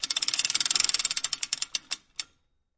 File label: turntable on